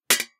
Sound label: plates hit; Kenney's Sound Pack; Foley Sounds; Plating